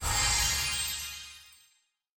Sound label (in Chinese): VIP升级; nba